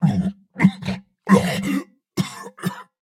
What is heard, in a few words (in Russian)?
Кашель; Турбосвин